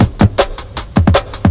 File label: SPEED, Big Beat Drumloops; USB Soundscan vol.25; AKAI